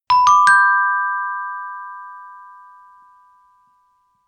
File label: notification